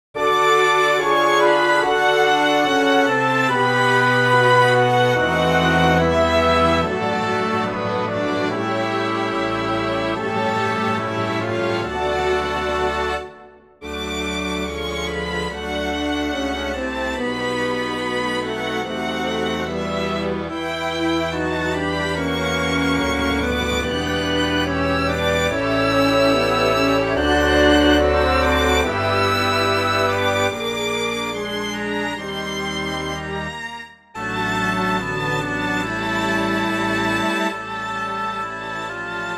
dream idyll for violin; synth

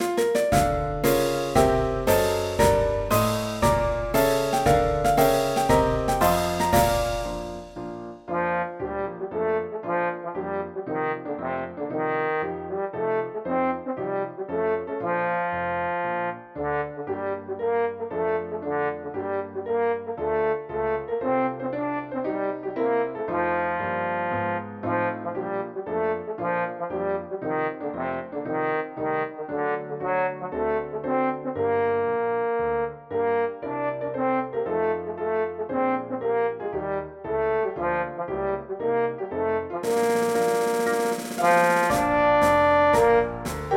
There something in the English for trombone; synth

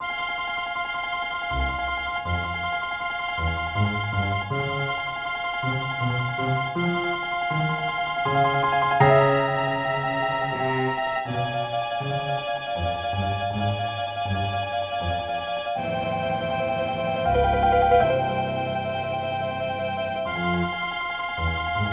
Melodramatic Mysterious; Violin, cello, piano; synth

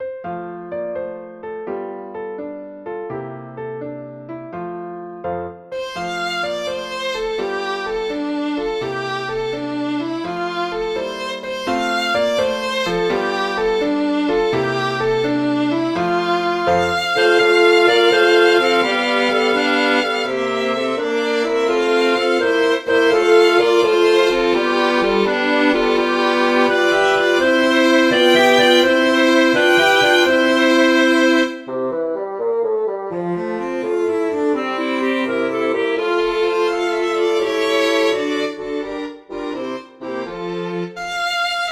Now brightly gleams for piano quintet synth

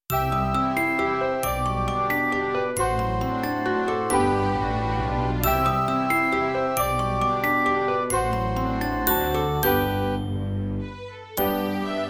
Swiss Dancing Doll orch synth; The Swiss Dancing Doll; Orchestra

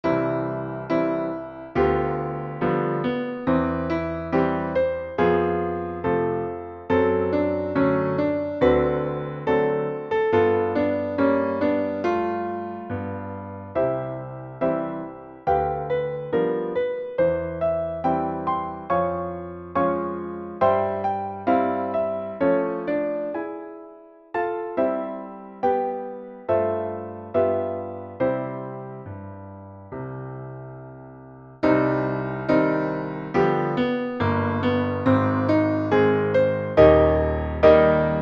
piano; Soft soft wind; synth

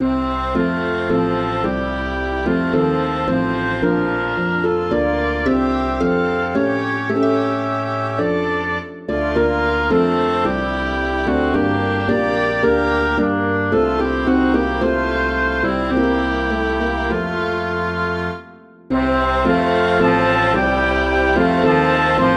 for band, synth; My wish

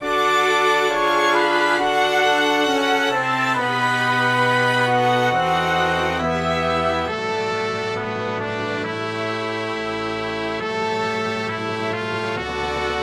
dream idyll for cello; synth

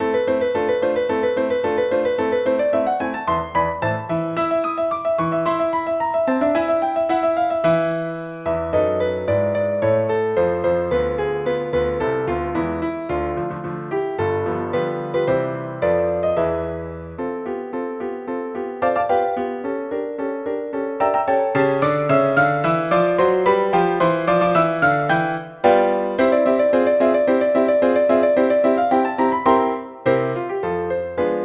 Make way for piano; synth

